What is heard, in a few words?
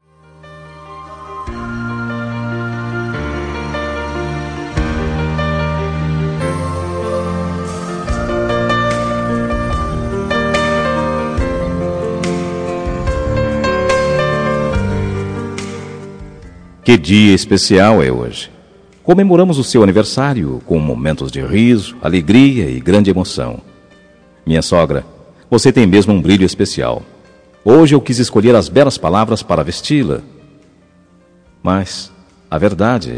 Instrumental